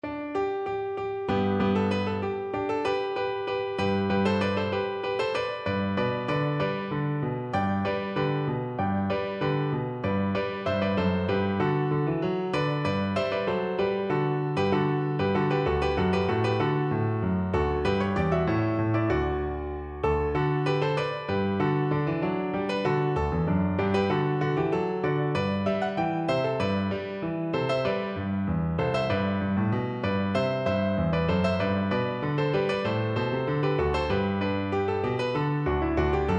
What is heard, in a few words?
rejouissance piano